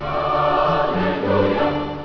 hallelujah; worms

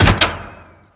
door move; shah

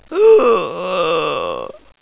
mummy attack

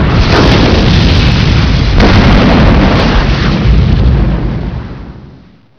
explode

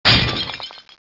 vase breaking

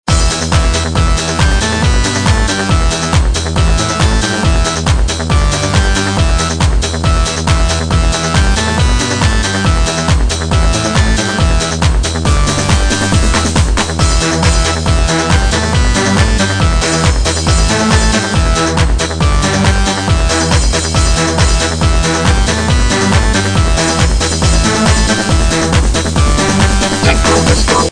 techno disco

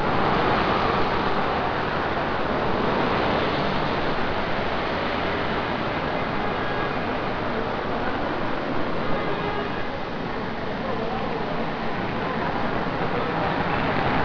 bronx ambient

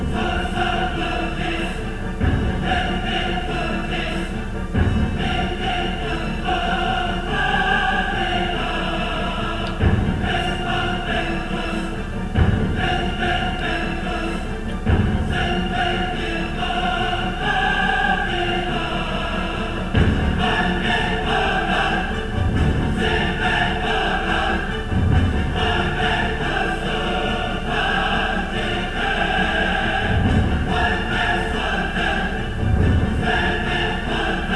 classical

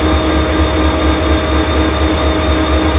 Machines Large

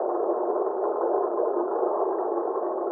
pipes; de grind

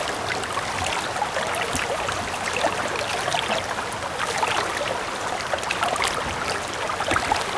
wa loop

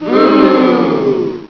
boo; colosseum

